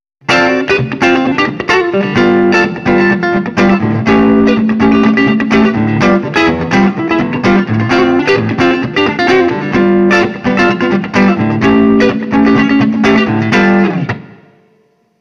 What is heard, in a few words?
Chords